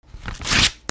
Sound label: RIPPING